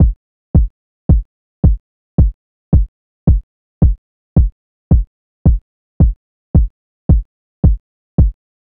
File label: 110 loop drums kick